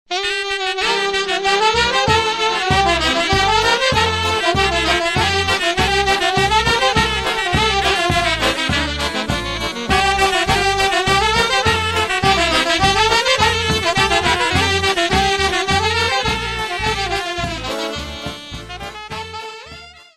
Hora - Roumanie

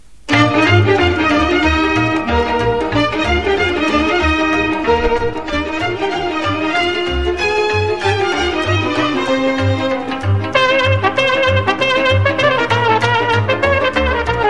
Sirba Moldovenesti - Roumanie

